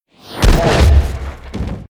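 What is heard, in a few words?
lesserking attack, monster; Senillinea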